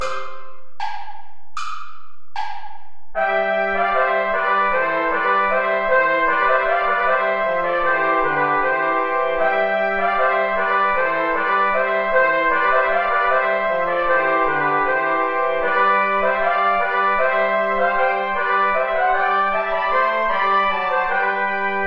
play along without trombone; deck the halls; traditional

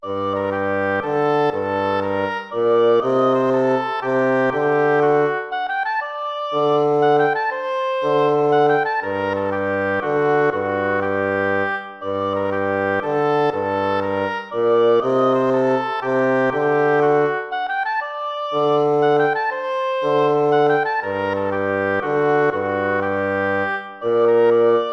baroque minuet minor

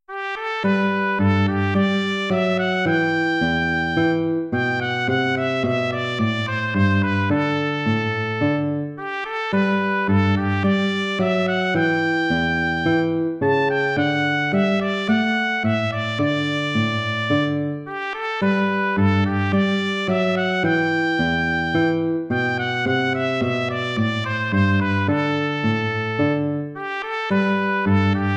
gavotte; haendel georg friedrich

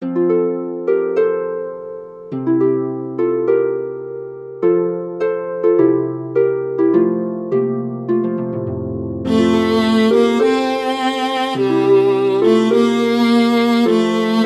for viola harp; Dieu d'amour

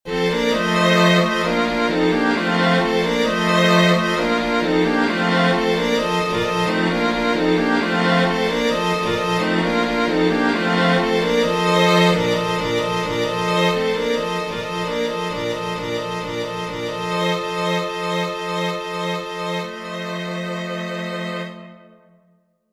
for string quartet; tchaikovsky piotr ilitch; peasant prelude

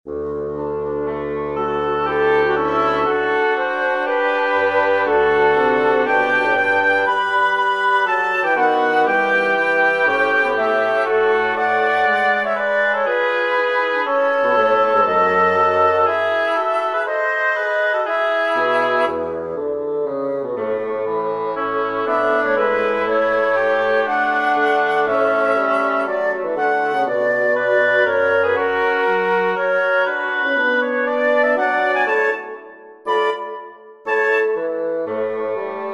for woodwind quartet; markull friedrich wilhelm; ist das heil uns kommen her

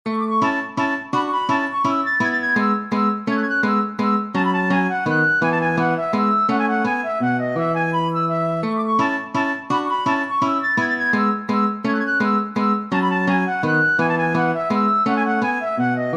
allegro major for flute classical guitar; arne thomas augustine

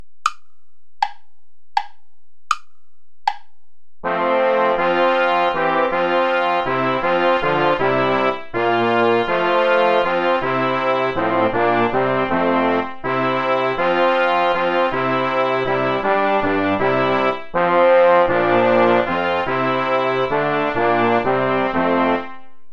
play along without trumpet

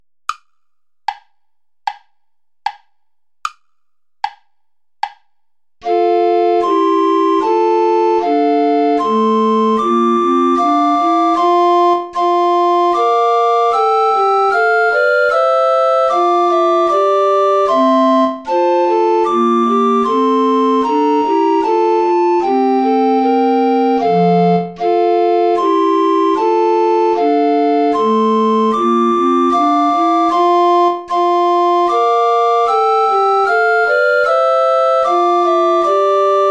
play along without alto recorder; bach johann sebastian

